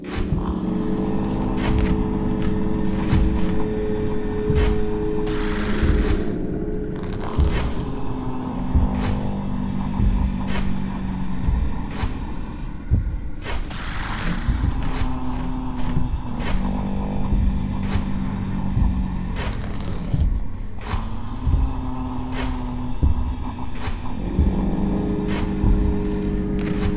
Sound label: Creep Monster